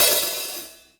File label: Hat Open Hardest; hydrogen drum samples